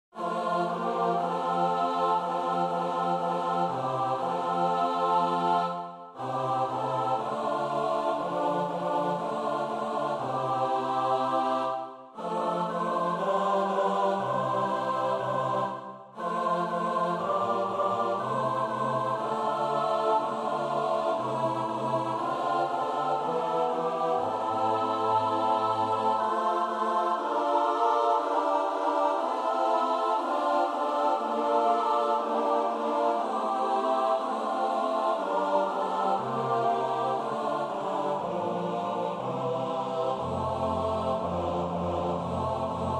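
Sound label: soprano alto tenor bass; Durufle (Maurice)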